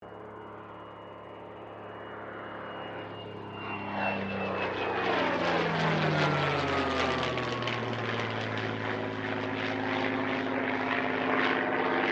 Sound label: AIRCRAFT SUPERMARINE SPITFIRE MK-XVI LOOP PA; Airplanes; Vehicles; Airplane Sound Effects